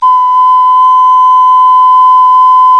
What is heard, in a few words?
CLAYFLUTE, Heart of Africa; Spectrasonics; Heart of Africa 1